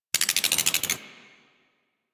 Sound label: Drifter Camp Valley Tree Critter; Ambience; One Offs; Warframe; Quests; The New War